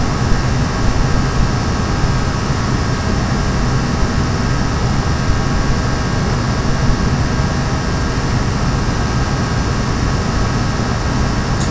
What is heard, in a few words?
Gaming Loop Noise